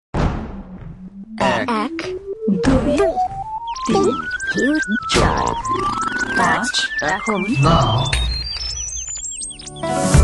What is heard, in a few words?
Sitar; TakaSitar